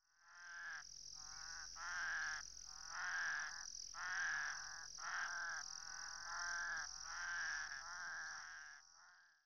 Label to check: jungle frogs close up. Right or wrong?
Right